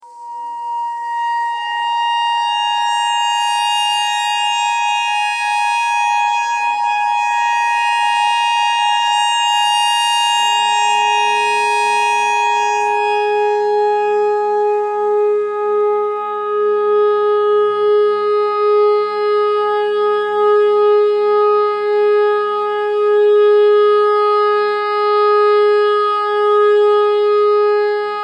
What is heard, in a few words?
clarinet notes e clarinet notes e pentatonic